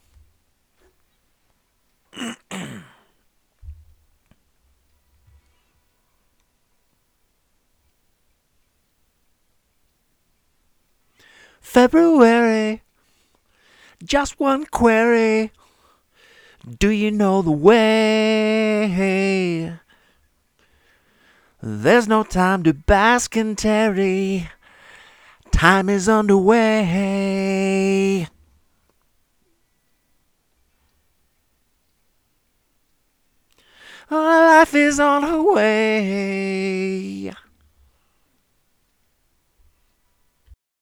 Edge Vocal

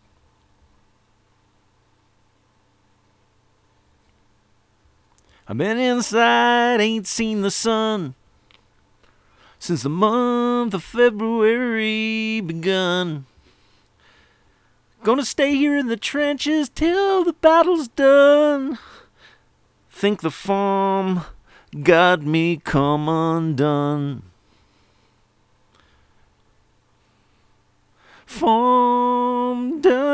Classic Vocal

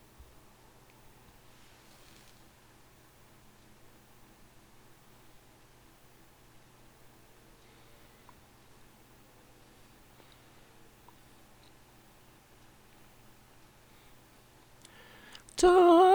Classic Vocal

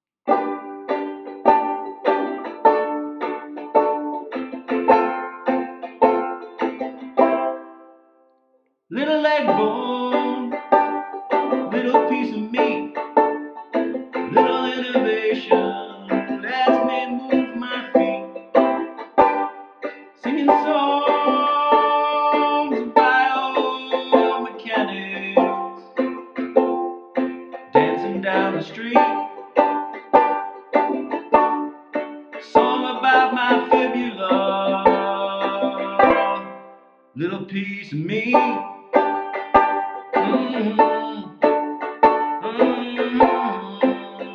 groove; FAWM2017

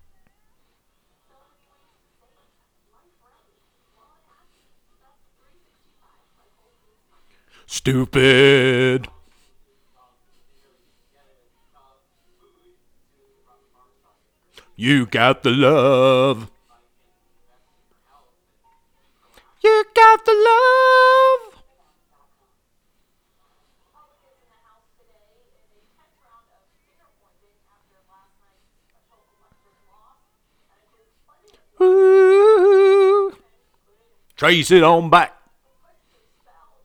60s Vocal Chorus

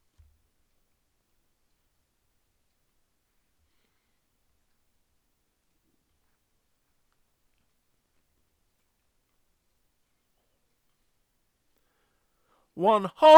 Telephone Vocal

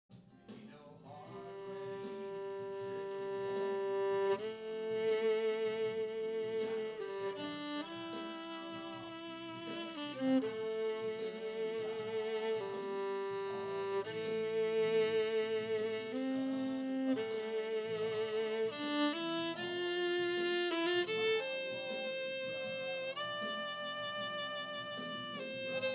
violin

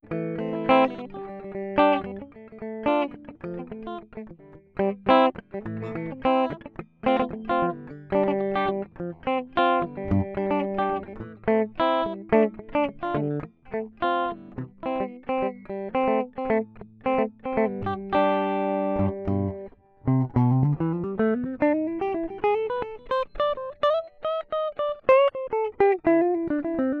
guitar glued

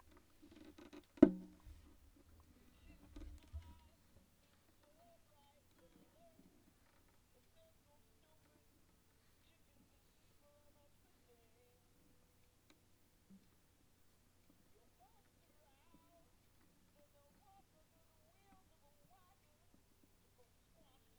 Country Gent

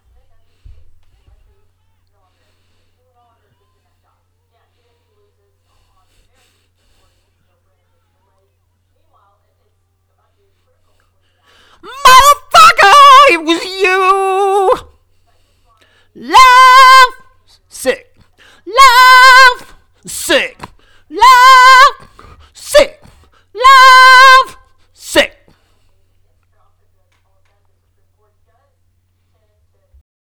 Dance Vocal

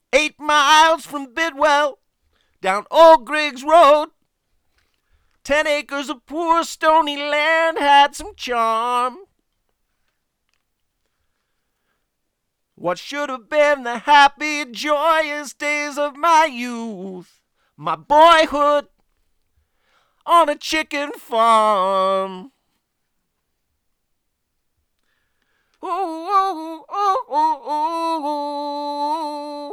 Telephone Vocal

